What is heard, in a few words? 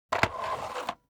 object household vacuum sweep back